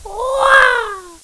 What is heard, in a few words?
wah